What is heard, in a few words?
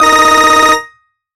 Motorola Classic 2